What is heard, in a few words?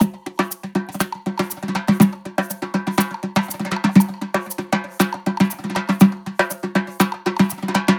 percgroove 120, Perc Grooves; Slate; SD SEISMIC Drum Sample Pack; Signature Perc Grooves